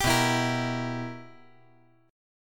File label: B Major 7th Suspended 4th Sharp 5th, chord